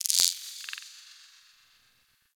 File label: Tech Very Small E, Ambience; Warframe; Cephalon